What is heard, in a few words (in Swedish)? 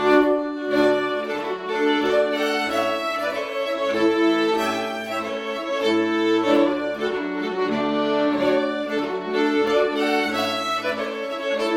slengpolska, folkmusik